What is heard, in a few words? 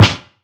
damped snare drum A key